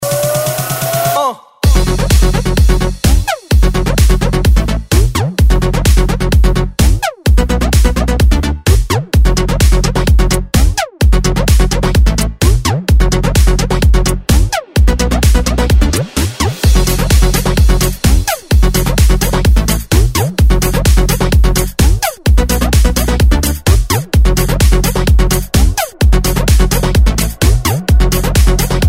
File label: electrohouse remix